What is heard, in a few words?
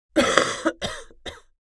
Old lady coughing